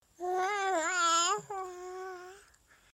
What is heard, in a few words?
Baby Sounds